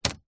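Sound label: vehicles windshield wiper release down on windshield exterior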